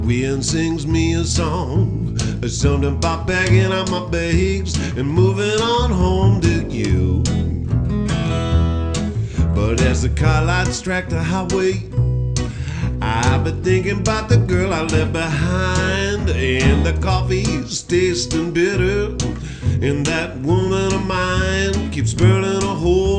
blues

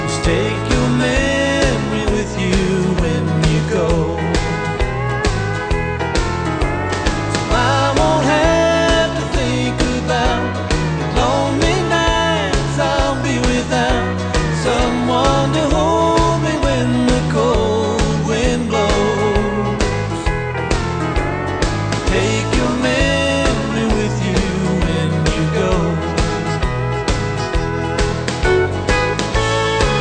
country

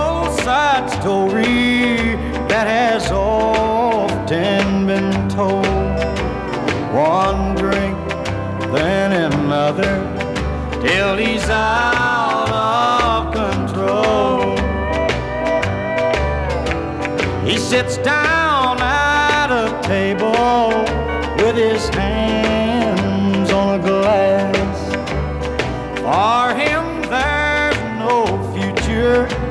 country

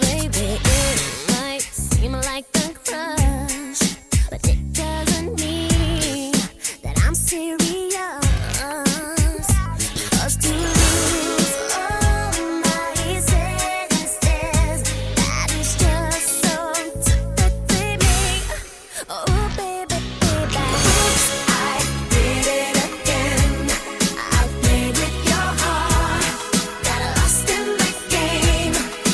pop

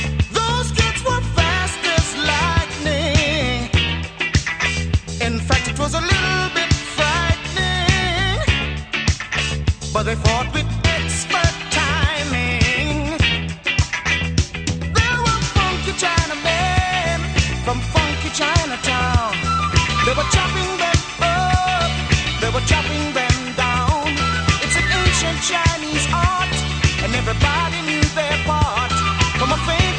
disco

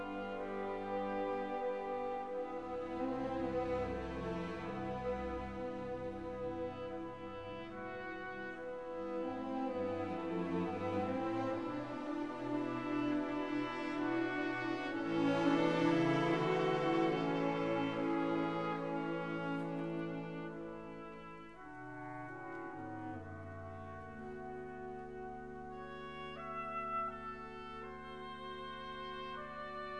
classical

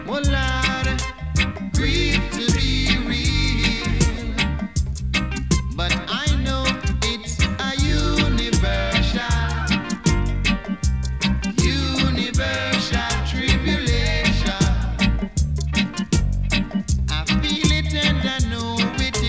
reggae

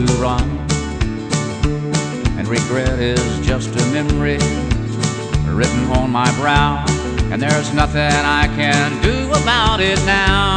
country

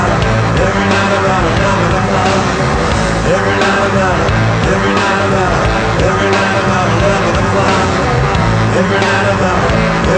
rock

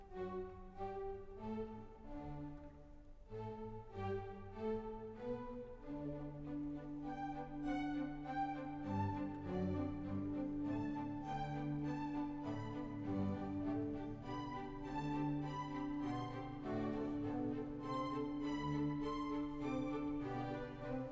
classical

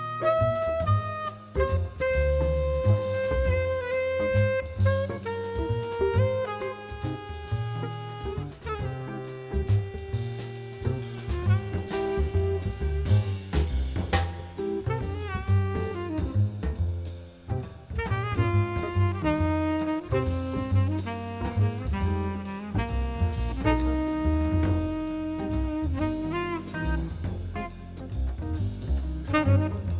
jazz

